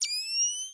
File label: rpg laser